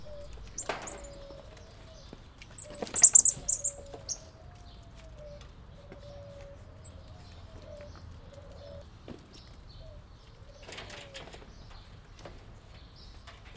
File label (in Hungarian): az elején hangosak, etetés, ormányosmedve; kecskemeti zoo 2016